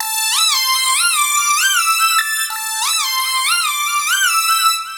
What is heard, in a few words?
Fx Lead; Sonic Foundry ACID Music